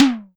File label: SIMMONS SD-5 drums; SDS5